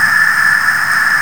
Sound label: VOX NOISE; AKAI S6000 CD-ROM - Volume 5